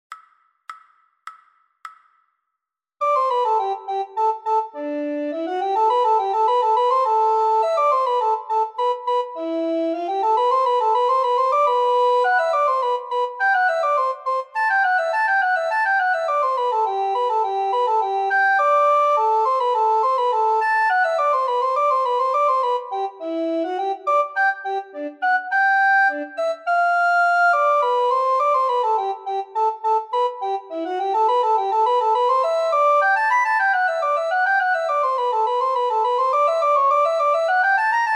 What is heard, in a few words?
descant, recorder